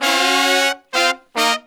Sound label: Phantom Horns, 132BPM; Zero-G